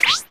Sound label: swiff